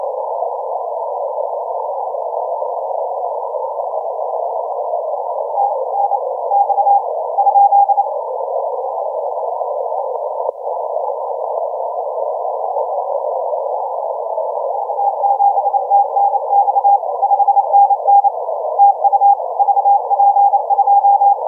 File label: 15m CW